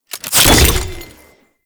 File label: Grenade Throw A; Quests; Veilbreaker; Kahl Abilities